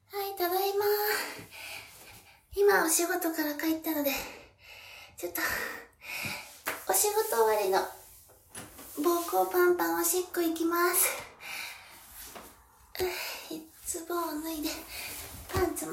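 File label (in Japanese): 膀胱パンパン我慢中！おトイレで！オシッコですッ！; お仕事帰りに！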